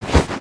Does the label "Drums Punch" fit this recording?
No